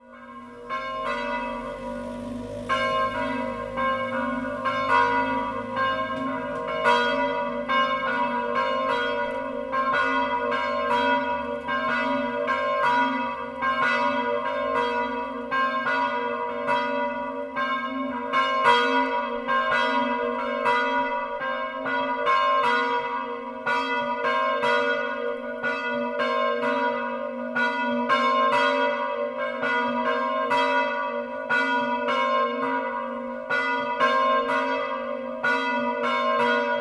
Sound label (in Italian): Polcenigo, campane; San Rocco